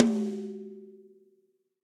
Tom High; Drums; Drum Hits; Studio Basic; Ableton; Session Drums Studio; MPE

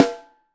Snare Top

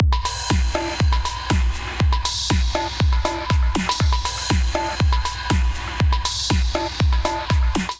Sad Drama Kit, drums; Ableton; Punch and Tilt

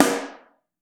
Snare Mono

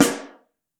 SN3 Mono, snare; Ableton Foundation FreePacks; Snare Designer

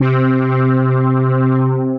Keys; Synth Keys; Metro 83 Keys; Ableton; Creative Extensions; Poli

